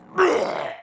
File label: dead, monster; maenghwan